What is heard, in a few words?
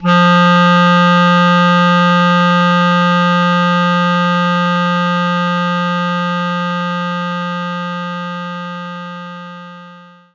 Clarinet F3; knights try